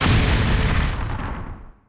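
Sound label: Laser Hit Exterior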